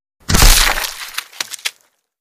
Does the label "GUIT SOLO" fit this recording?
No